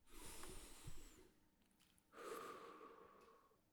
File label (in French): soupirs; Index des soupirs 31 – Hadjithomas Joreige